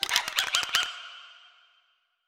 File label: shake, halloween; player